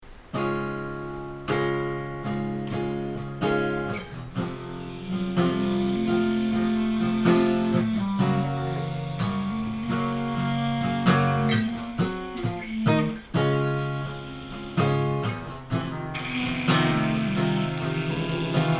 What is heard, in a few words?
acoustic; cover